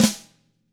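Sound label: DW flam; ILIO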